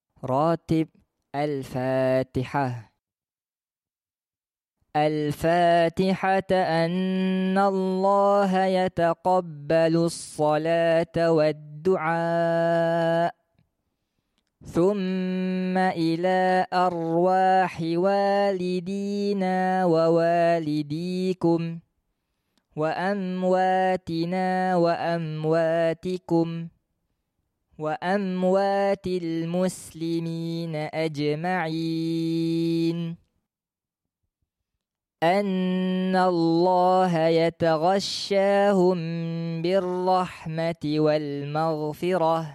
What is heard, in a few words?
ratib alfatihah